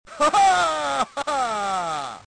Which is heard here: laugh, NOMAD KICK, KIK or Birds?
laugh